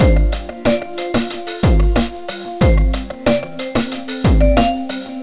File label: drumloop; awe32